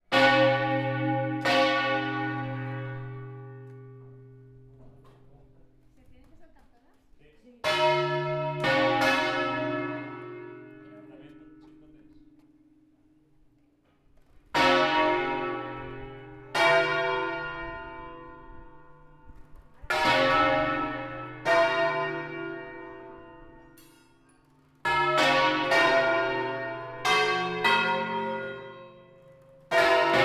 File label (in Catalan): campana